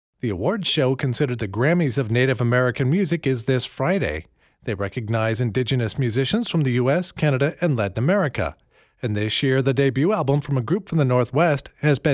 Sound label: INDIAN DRUMMING